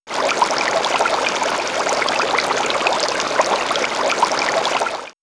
Nature Underwayer; X-Static Goldmine

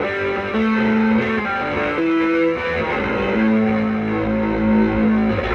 Fierce, Electric Guitar; Sonic Foundry (Sony Creative Software); Friendly Fire; Wrath

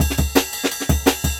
Tone; 90 s Sample CDs; Original Jungle Breaks

